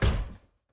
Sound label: Footstep Robot Large